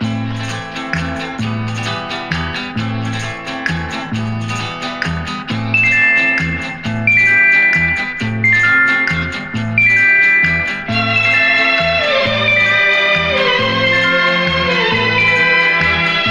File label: guitar keys strings, Loops, Sad